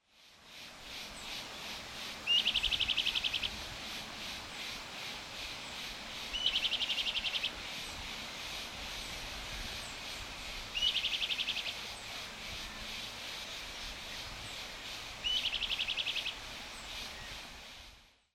Filipe Myiophobus fasciatus; Jacauna

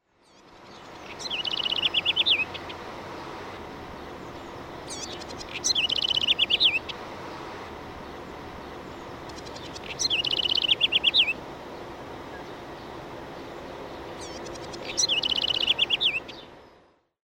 Corruíra Troglodytes musculus; Jacauna

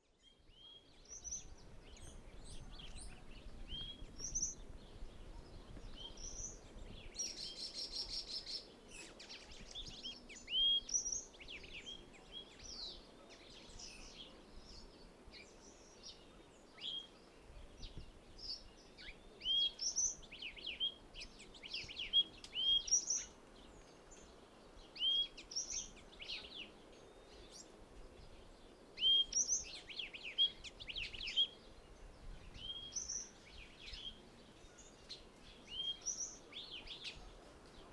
Fim-fim Euphonia chlorotica; Jacauna

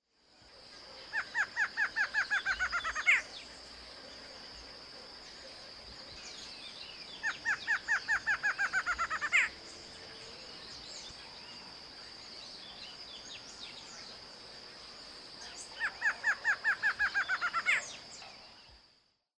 Choca-barrada Thamnophilus doliatus; Jacauna

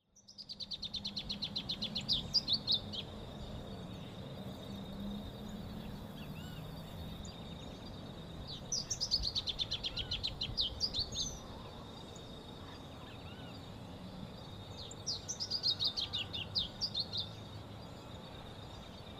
Pia-cobra Geothlypis aequinoctialis; Jacauna

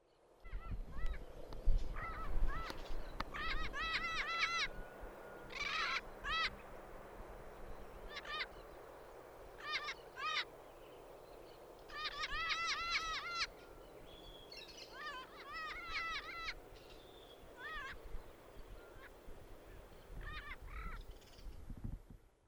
Papagaio-verdadeiro Amazona aestiva; Jacauna

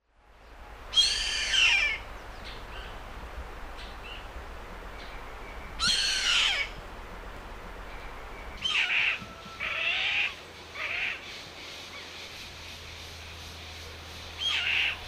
Milvago chimachima Gavião-carrapateiro; Jacauna

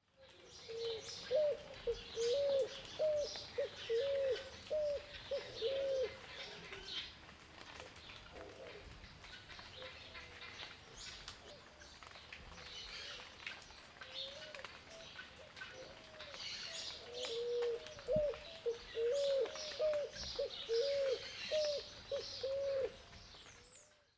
Pombão Patagioenas picazuro; Jacauna

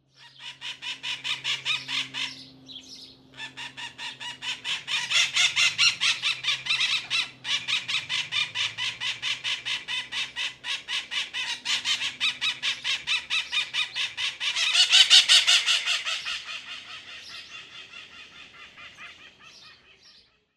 Periquitão-maracanã Aratinga leucophthalma; Jacauna

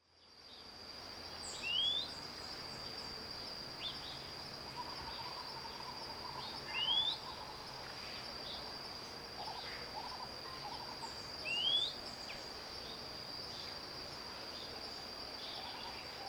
Guaracavuçu Cnemotriccus fuscatus; Jacauna

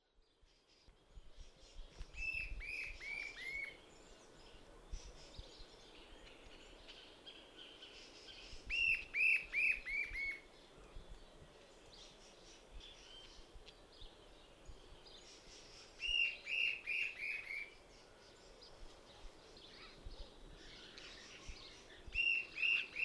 Arapaçu de cerrado Lepidocolaptes angustirostris; Jacauna

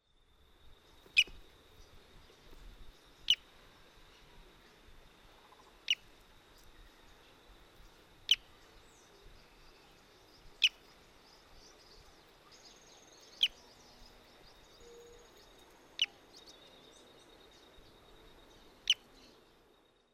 Lavadeira-mascarada Fluvicola nengeta; Jacauna

